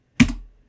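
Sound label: enthusiastically pressing the enter key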